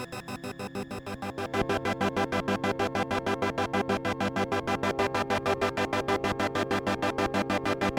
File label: G fifths